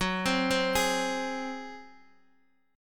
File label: F sharp Suspended 2nd Flat 5th, Guitar, DADGAD; chordshape; down